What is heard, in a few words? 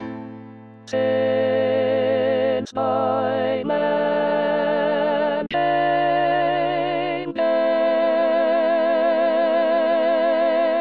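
alto; messiah; Since by Man Came Death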